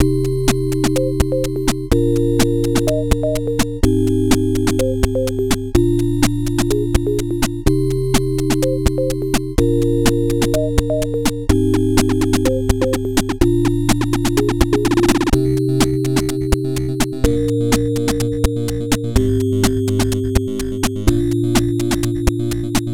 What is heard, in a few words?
MSX